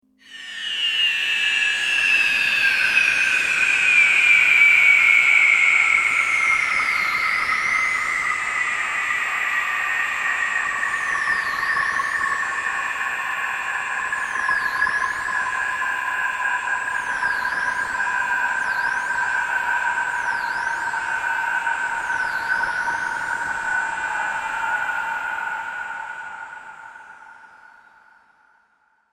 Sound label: UFO Landing, Descending UFO; Spacecrafts